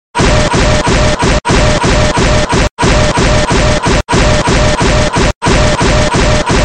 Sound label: boom boom boom